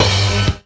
GRUNGE; AMG - Vince Clarke Lucky Bastard

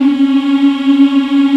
African Mm f; Roland LCDP11 Africa VOL-1

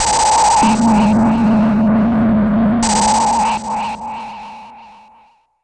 pool, Drum N Bass; Sonic Foundry (Sony Creative Software); Knutrix Drum & Bass

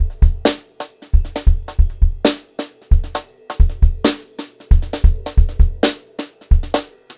Brushed Drum Loops

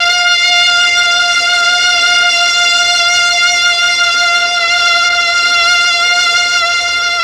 MEL STR, Legendary M400; ILIO; Propeller Island

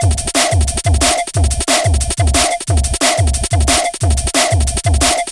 Future Beat, Drum & Bass; Fluid Dynamics

